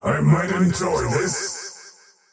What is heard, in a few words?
cast pain; dota; faceless void